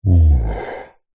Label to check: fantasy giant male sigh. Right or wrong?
Right